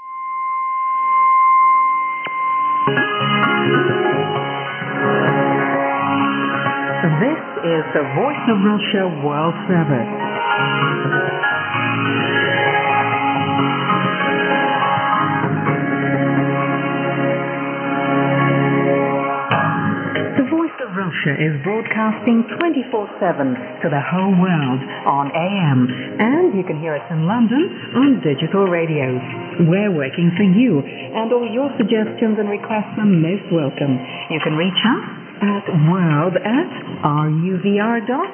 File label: Russia engl 9800kHz